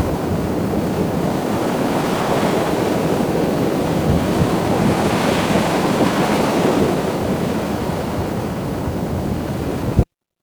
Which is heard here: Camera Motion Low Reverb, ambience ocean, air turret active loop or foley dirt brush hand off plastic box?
ambience ocean